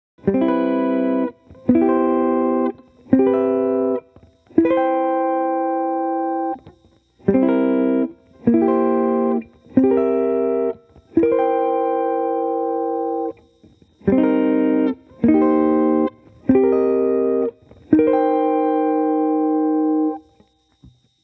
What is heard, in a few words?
Funk Voice Leading